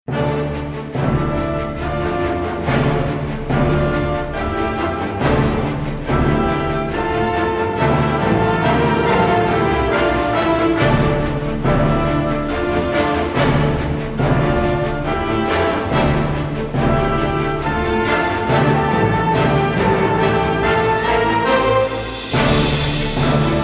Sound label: Orch Only; Abbey Road